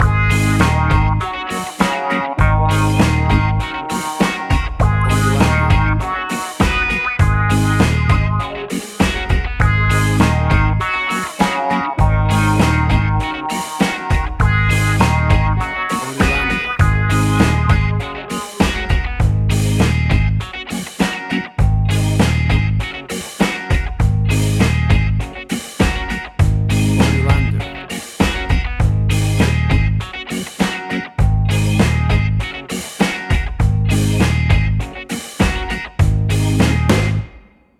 Funky Groovy Reggae Ska Music

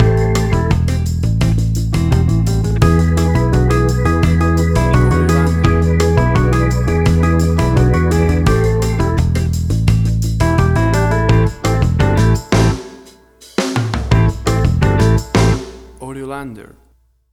Acid Jazz Fusion Background Music; 15 SEC

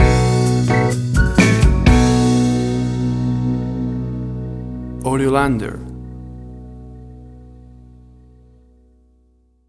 Bossa Blues Stinger

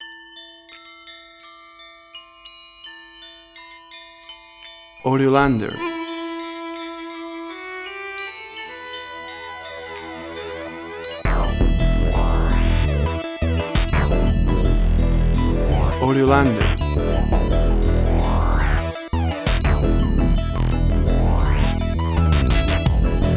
bells and electro

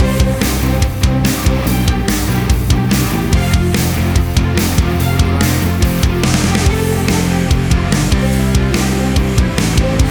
Big Stadium Classical Rock Crossover

